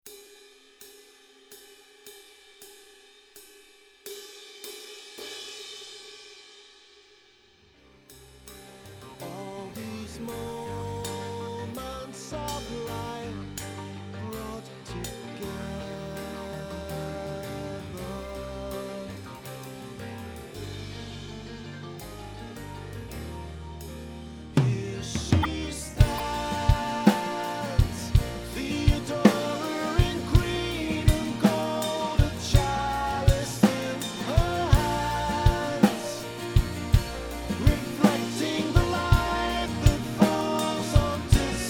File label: ride cymbal